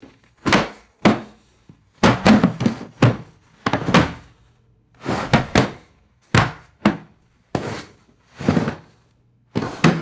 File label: heavy chair tossing and banging on carpet